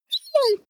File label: dog whine; natur-tiere; hunde